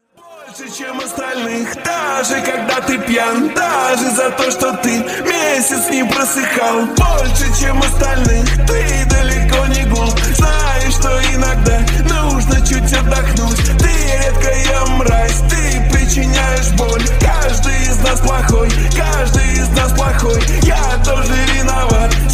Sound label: slowed reverb